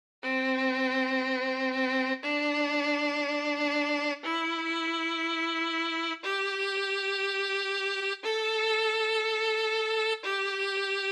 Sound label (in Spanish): Pista ascendente